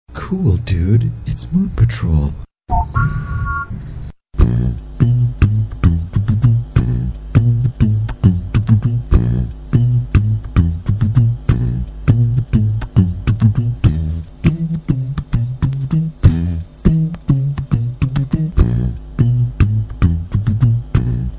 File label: Vox Beat